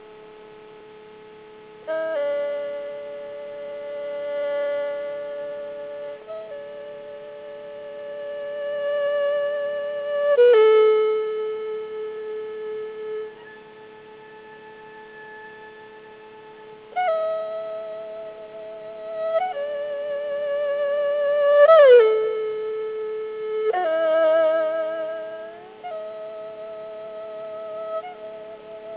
Empty Mountain Birds Singing, traditional chinese music